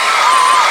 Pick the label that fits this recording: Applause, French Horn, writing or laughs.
Applause